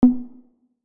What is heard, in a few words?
spinner bonus; « DEKU » - MHA